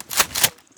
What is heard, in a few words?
spas pump; weapons; garrysmod